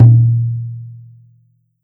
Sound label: Tom Mid; Fesliyanstudios